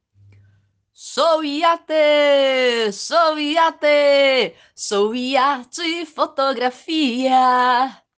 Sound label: jingle vocal; showart fotografia